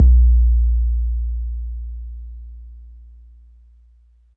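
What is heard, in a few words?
bass drum 808 MPC60 Smooth A#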